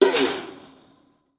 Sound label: rope break